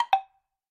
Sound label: success